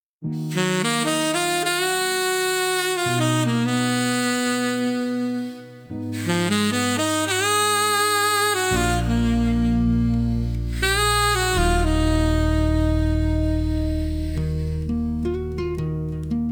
golden sax vibes

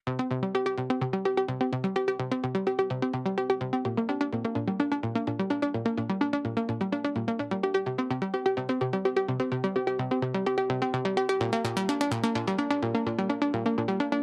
Electronic Impulse

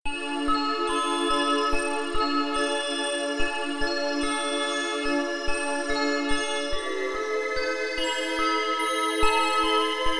warm feelings 432 Hz; yellow dream

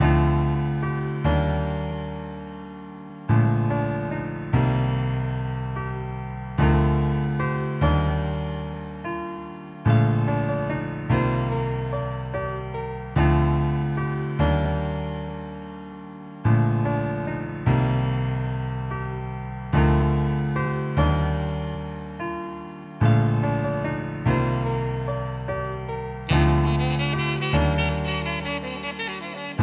chilling funk nights 432 hz

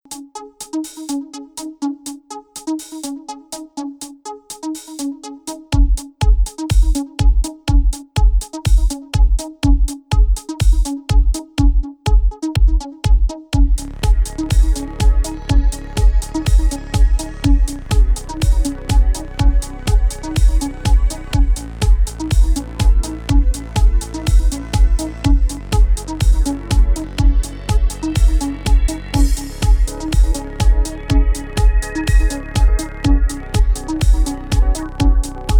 aerobic dance training, 432 Hz; 123 BPM

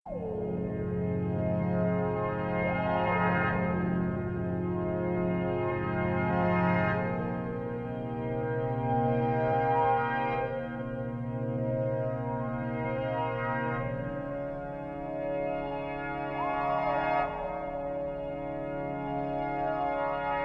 vocall chill voice from the depths 432 hz

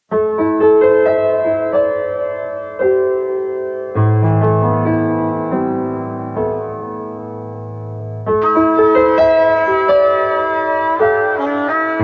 piano music, 432 hz; MIEJSCE ZAWSZE DLA CIEBIE

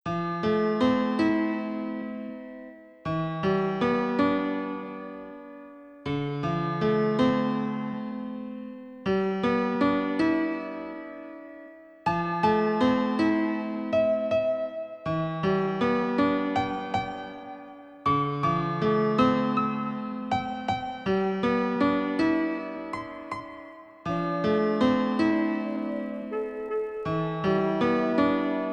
gentle sound 432 hz; Jestem tu...

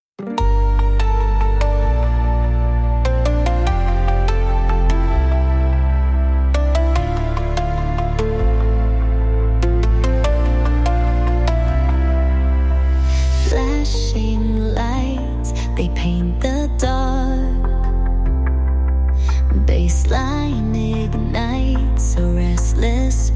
Nightclub

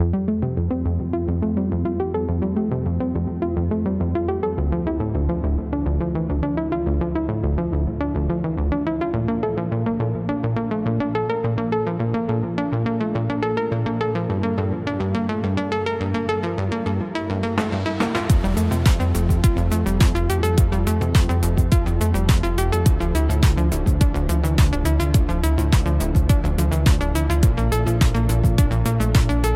Electronic Impulse

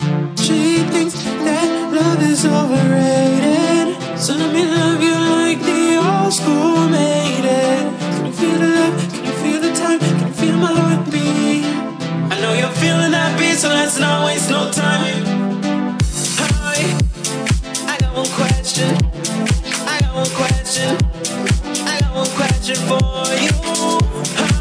Funky House Vibes

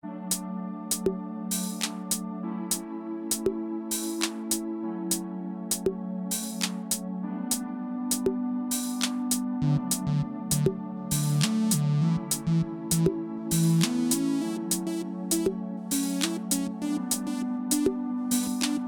vocal chill, 432 hz

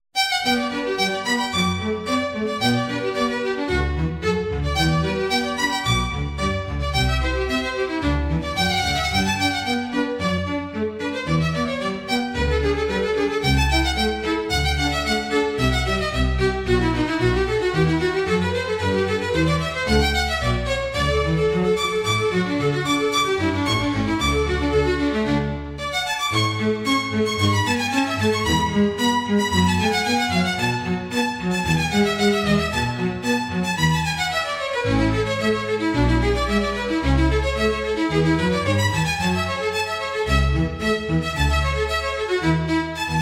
Baroque Splendor

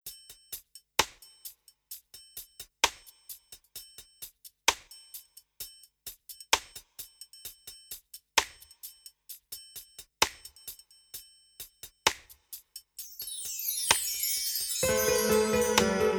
smooth sounds 432 hz

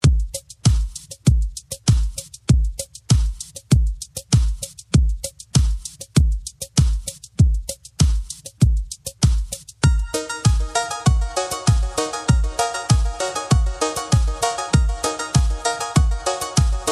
Funky Beats, Electronic

